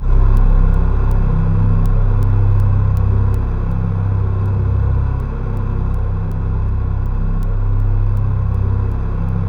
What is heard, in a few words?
C1, Synth Leads; 90 s Sample CDs; NiceBeats (NiceBytes); Studioline 09